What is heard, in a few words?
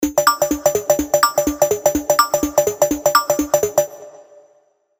Tech Background